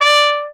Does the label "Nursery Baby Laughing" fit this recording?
No